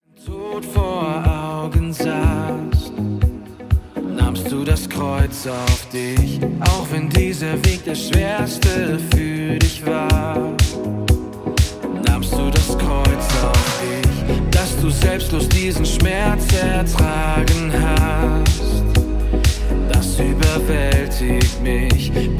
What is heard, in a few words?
Remix